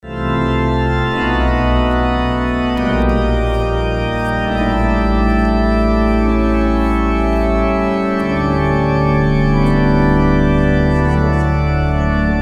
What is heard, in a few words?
kirk organ coda; Kirkwall